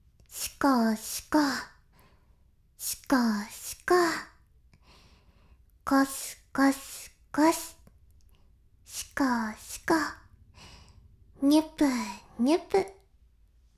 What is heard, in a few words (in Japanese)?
しこしこ音声, ASMR; 白くて黒い魔性円環